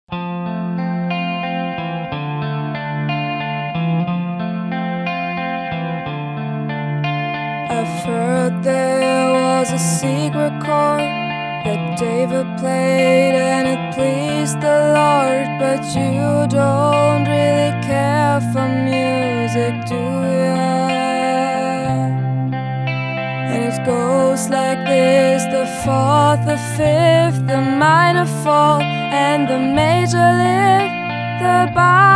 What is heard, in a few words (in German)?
Gesang